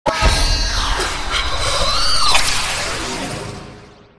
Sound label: teleport zombis; de kinodertoten